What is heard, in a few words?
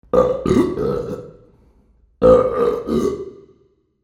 Hendrix Hall burp